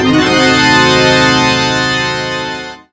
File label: Piano Scary Short